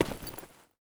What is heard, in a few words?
default, actor step; Soundscape Overhaul